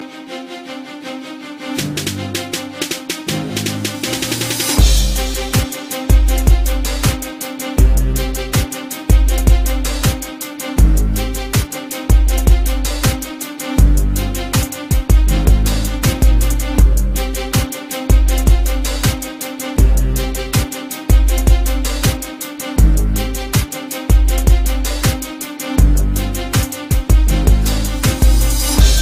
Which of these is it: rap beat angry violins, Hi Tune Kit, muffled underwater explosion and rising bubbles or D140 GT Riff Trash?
rap beat angry violins